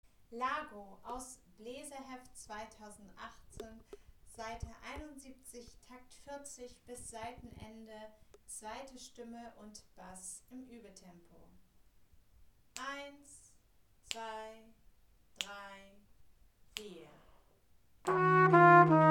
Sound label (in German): Largo Aus der Neuen Welt Stimme 2 und 4, Posaunenchor; Dvorak; Takt 40 bis 59